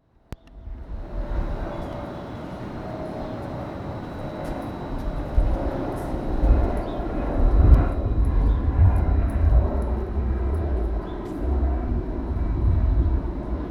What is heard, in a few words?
art building noise